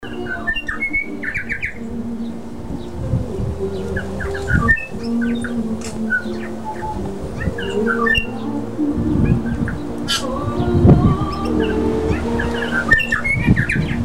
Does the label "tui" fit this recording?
Yes